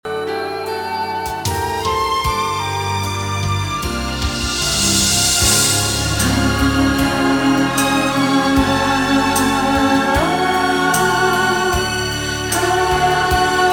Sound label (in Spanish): Contraltos